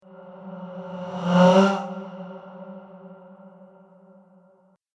The Passing Ghost Wails; Sinister, Scary, Spooky Or Just Silly; Haunted House Or Graveyard; Andrew Fleming

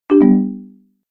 Message Alert, beep

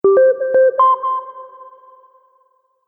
Soft Subtle Notification; Musical

